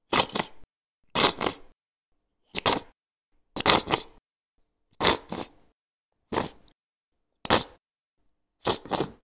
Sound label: Ketchup Burp